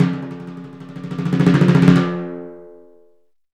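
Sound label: timpani roll, crescendo; Roland LCDP03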